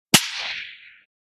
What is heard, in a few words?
300 Blackout sniper rifle suppressed shot